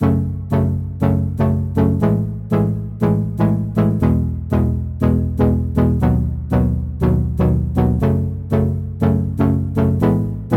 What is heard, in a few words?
Serum Chords